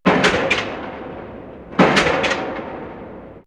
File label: Mega Switch; X-Static Goldmine